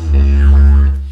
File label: Didgeridoo Kit Samples